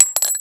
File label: ammo casings